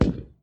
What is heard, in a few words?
lift, firework